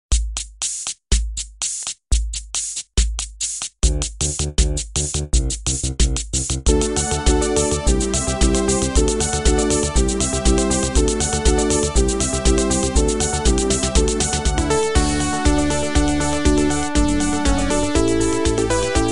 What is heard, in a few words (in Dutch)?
karaoke; openbaar vervoer